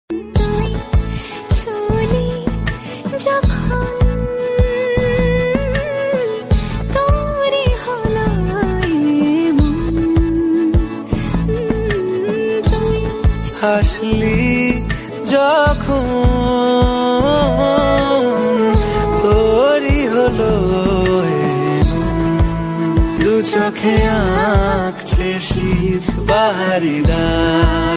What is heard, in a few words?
bengali ringtone